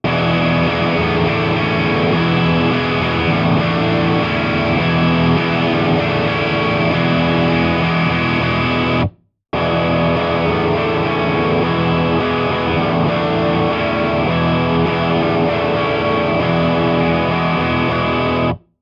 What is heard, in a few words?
Super Focused Metal Amp